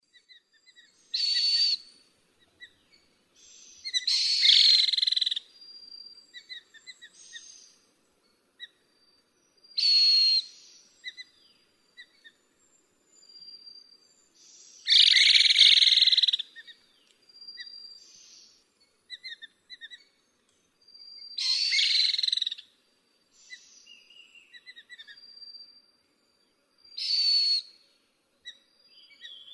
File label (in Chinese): 台灣白喉噪眉; 白喉笑鶇; 噪眉科/留鳥